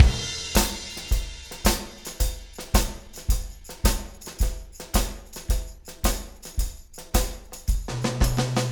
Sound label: Room